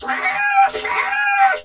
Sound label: Rocket Chicken